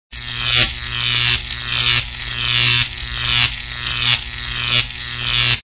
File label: cpu fan